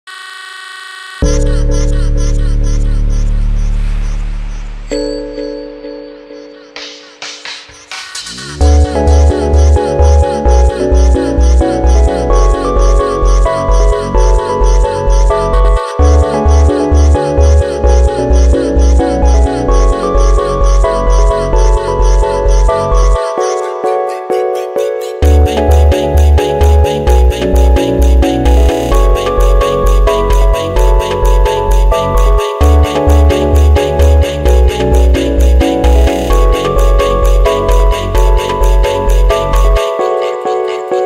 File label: Phonk MONTAGEM